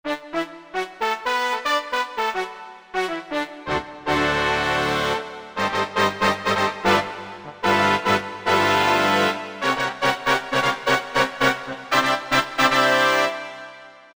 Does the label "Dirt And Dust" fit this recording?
No